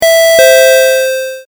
correct; ちょっとゆっくりのピンポン超低音ver; 正解の音・ピンポーン

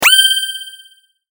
sword

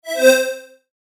correct; 遠くから走ってくるような正解音。; 正解の音・ピンポーン

